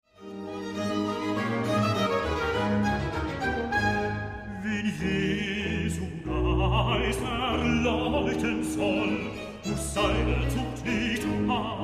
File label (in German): Arie Bass Wen Jesu Geist erleuchten soll